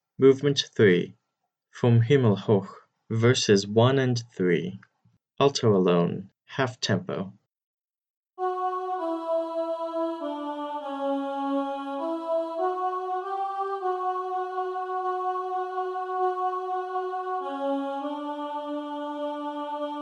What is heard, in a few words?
alto alone half tempo, choir